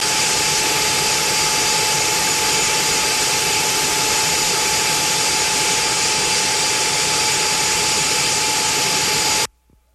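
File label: sounds of a wind turbine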